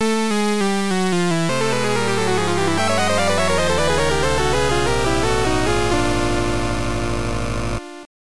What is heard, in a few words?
LAST ROUND CLEAR